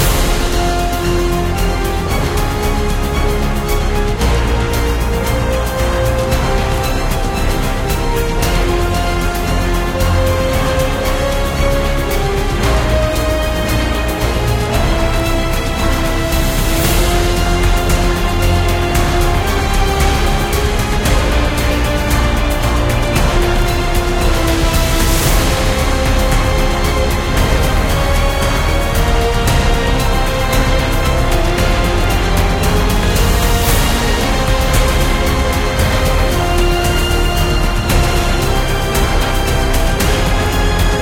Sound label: fight back loop